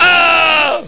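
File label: scream; duke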